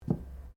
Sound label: cutlery general place cloth, Household cutlery